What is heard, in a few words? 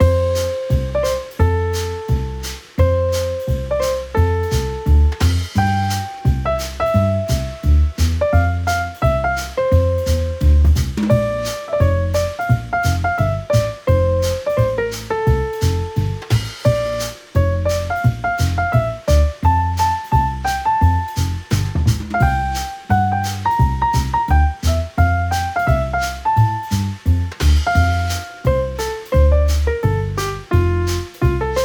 Silent night vintage christmas jazz